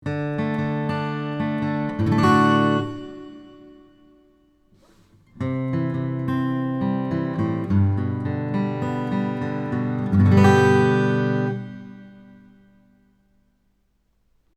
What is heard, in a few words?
AcGit Hall